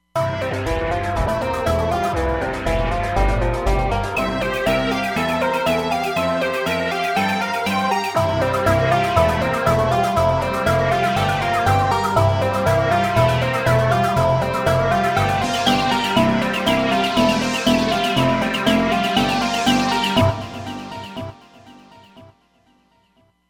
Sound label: FX Orchestra, Roland Fantom XR